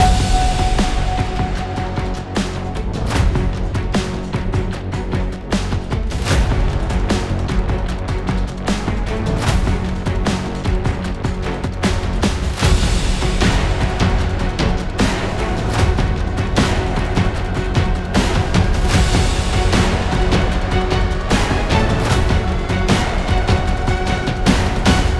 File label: Library Trailer